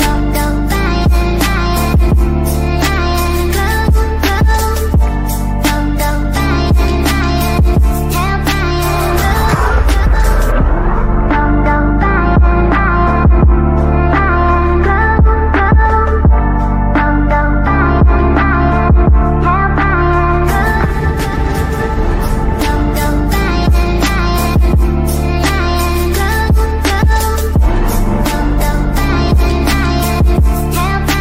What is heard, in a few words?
Ad Song Ringtone; Zomato Ad